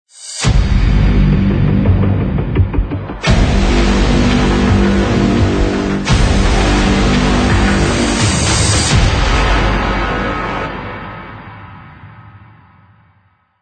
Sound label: Action Trailer Intro